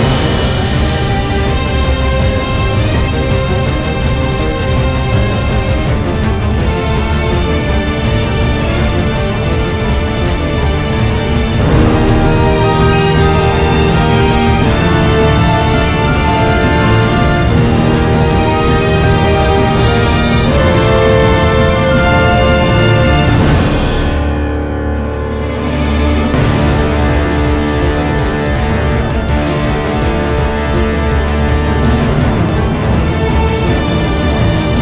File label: Guild Suppress Loop